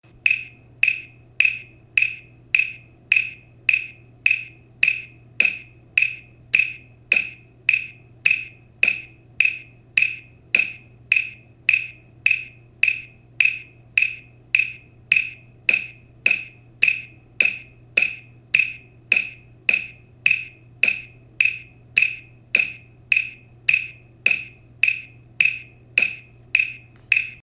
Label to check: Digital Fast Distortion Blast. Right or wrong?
Wrong